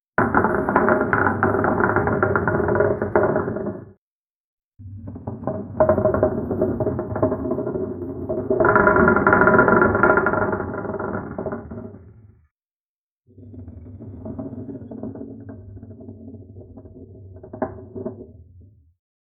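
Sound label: a slow heavy deep grinding, textures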